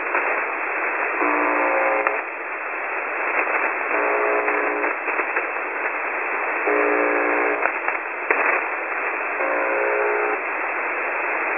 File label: The Buzzer 4625.0kHz, shortwave; sdr; adventures in shortwave